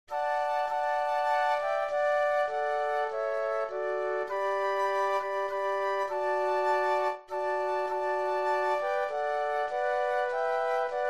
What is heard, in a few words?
Flute Trio